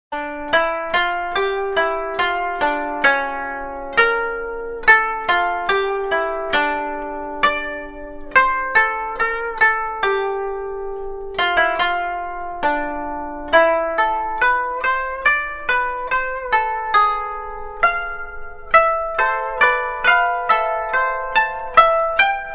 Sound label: Japanese Classical Music